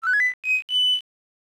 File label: Panpipes; Ultima Runes of Virtue II; SNES